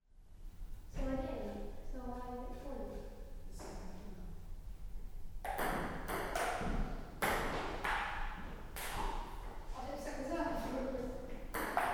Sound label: weybridge ping pong